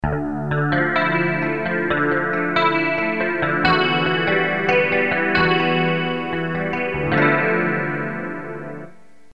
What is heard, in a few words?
Guitar